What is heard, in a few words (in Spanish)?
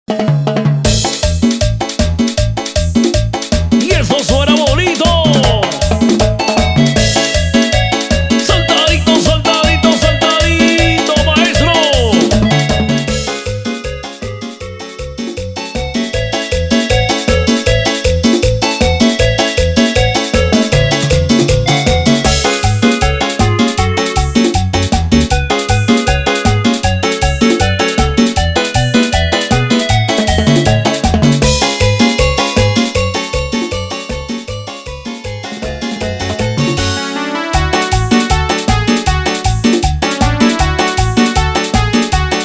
Instrumental Ec BPM 157